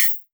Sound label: Closed Hihat; Cymatics; EDM Starter Pack; Drums - One Shots; Cymbals